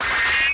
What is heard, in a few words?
Cut Bottle; RedDot